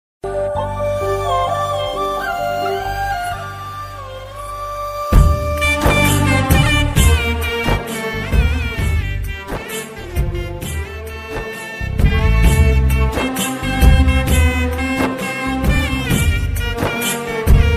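love ringtone tamil love ringtone love background music ringtone; Varuthapadatha Valibar Sangam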